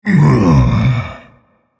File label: Vocal Efforts Light; Minecraft Story Mode; Colossus